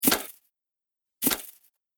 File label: Camera Bulb Flashes; Electronic Sound Effects